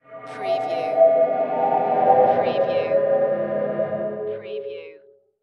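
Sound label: Production Element Feedback; Sound Effects Bible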